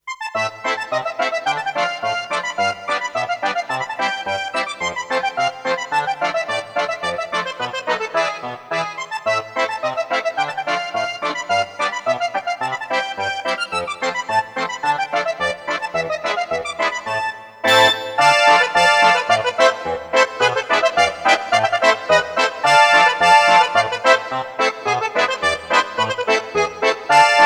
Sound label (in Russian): starosvetskaya polka syntezator